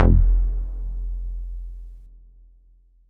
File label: Lo Sq F1, Synth Hits; Zero-G; Soundclash Nu Dance Electro; Acidized